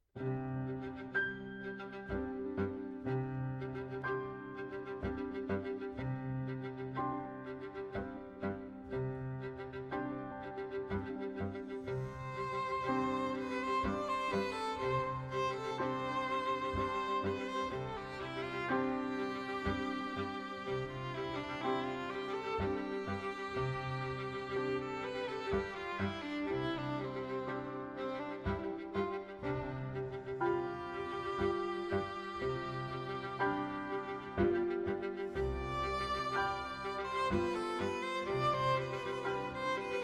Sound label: 2 Violins and Piano; Boléro